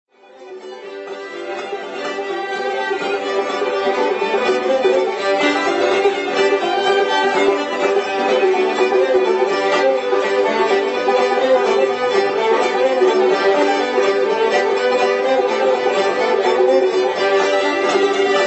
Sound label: Fiddle GDGD, Fiddle, Uke, Banjo; Hell Up Coal Holler; Old-Time JAM